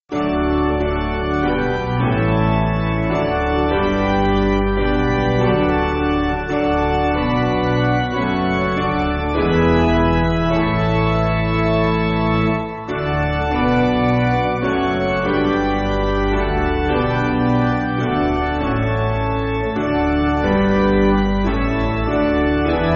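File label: Piano, Music Backing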